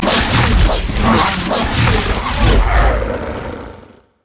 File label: wolfman infinite duress; LoL; warwick